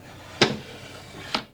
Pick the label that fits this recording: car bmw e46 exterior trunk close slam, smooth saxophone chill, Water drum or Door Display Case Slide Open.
Door Display Case Slide Open